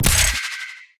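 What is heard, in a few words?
mag spell, impact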